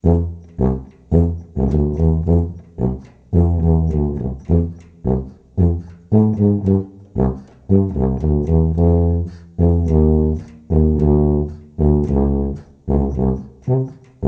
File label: Sousaphone